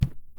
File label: step; 足音(STEP SE)